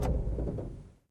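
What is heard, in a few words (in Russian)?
безуспешная попытка завода неработающего двигателя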